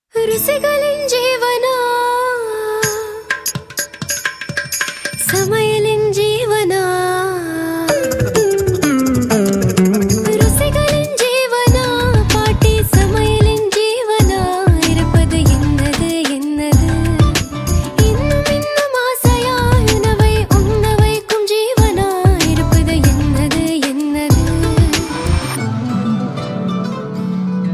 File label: Mandolin